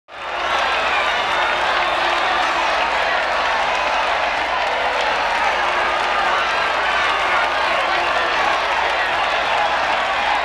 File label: huge crowd applause; Asian Show Sound Effects